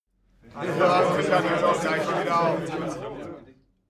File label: male crowd happy short